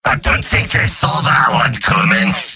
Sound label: cheers